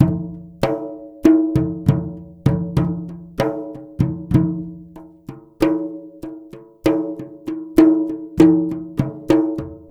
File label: MLY DRMS; Spectrasonics - Heart of Asia CD2